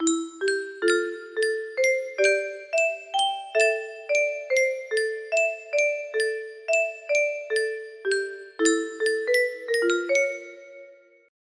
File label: music box